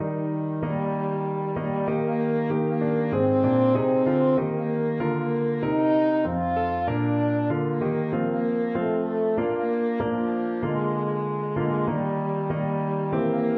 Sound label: Navy Hymn, french horn